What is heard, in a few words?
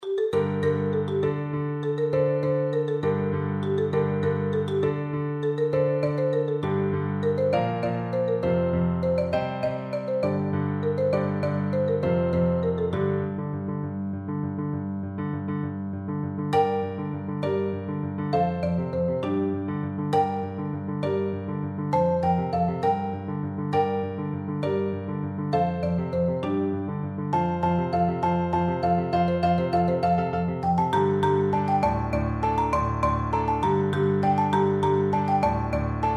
MBA